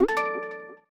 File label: x Times Win; netent